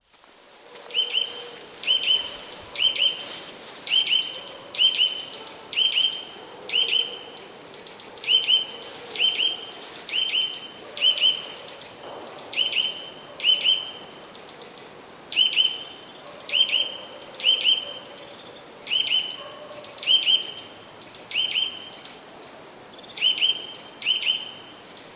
kowalik szczebiot, nawoływań kowalika

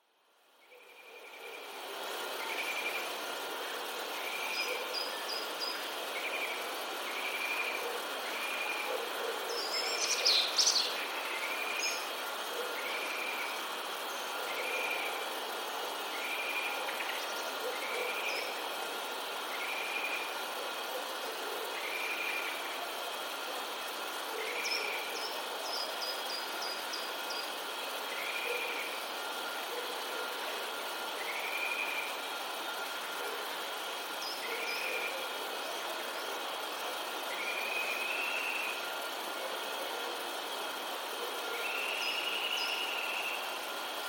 kowalik; szybkie i głośne tryle; Kowalik potrafi płynnie zmienić ten szczebiotliwy śpiew w szybkie i głośne tryle

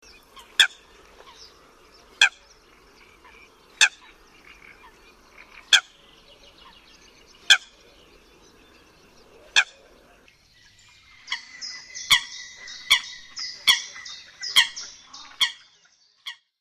lyska, prawdziwej łyski; wroblowe; zapozyczenia

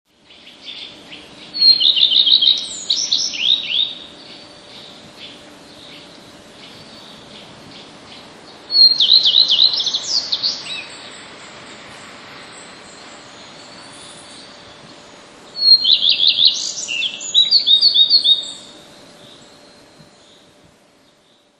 pleszka, 3 piosenki pleszki, która na końcu trzeciej piosenki próbuje naśladować sikorę bogatkę; wroblowe; zapozyczenia

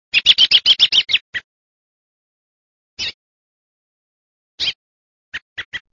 drozd wędrowny - inne nawoływanie; wroblowe; turdidae; Turdus migratorius

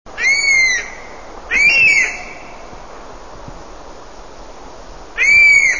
trzmielojad, głosy

